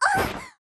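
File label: Npc Harpy Damage; FlyForInsanity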